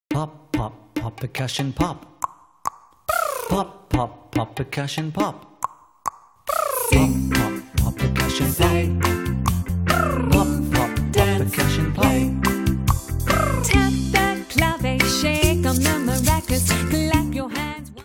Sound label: Percussion Pop sample